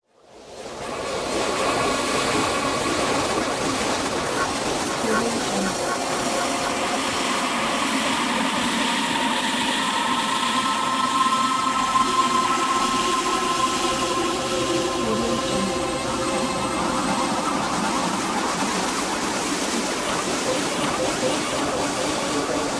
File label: time travel ambience